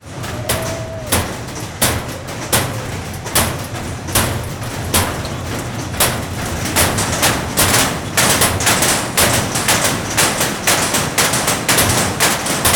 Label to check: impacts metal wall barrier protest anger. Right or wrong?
Right